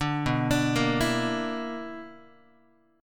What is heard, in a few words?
B-Minor Major 7th Double Flat 5th, Guitar; BmM7bb5 arpeggiated; JGuitar